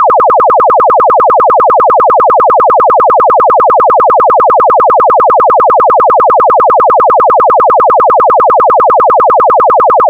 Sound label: chirp; chirp toys